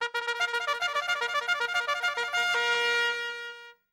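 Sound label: call to action battle trumpet, military exercises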